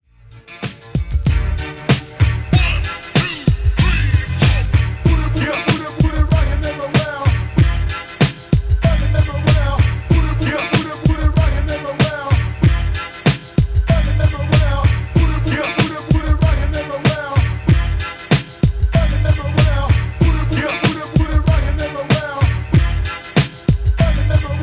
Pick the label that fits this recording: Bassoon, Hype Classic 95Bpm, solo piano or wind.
Hype Classic 95Bpm